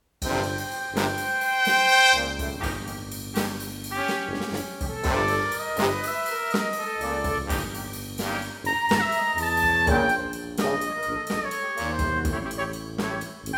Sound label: Brass Thing